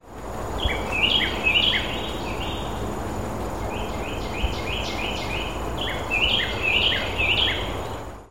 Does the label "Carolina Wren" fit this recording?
Yes